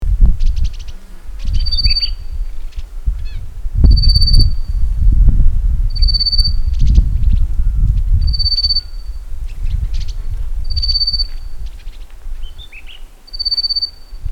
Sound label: Rufous faced Warbler Abroscopus albogularis